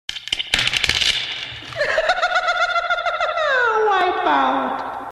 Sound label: HAHA